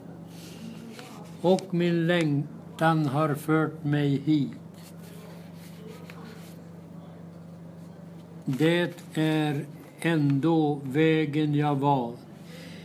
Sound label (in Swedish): alto